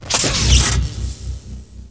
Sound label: blaster; Genesis3D